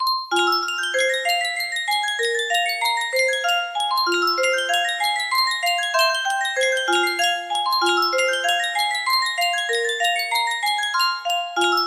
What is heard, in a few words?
yunsheng spieluhr, mazurka, music box melody